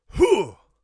attack, Warrior Voice; Warrior Voice Pack

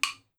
wood; abstract; Free Sound Assets

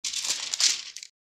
book; RPG

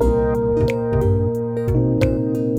Rhodes; BB 2HTC Samples Vol 4; Solos

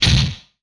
Gun; MMRetroArcadeSoundsPack1 0 5

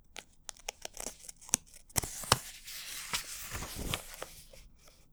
Riffle Turn Page, books, paper; Books, Paper, Writing

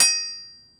Big Knife - Tap, kitchen knives; Micro Pack

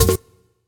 Error Action; FREE SFX PACK VOL 2

